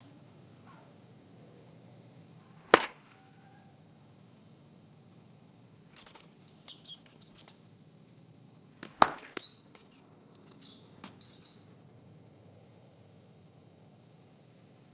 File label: three golf putts with background birdsong; sports